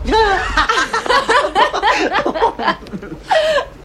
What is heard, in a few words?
man and woman laugh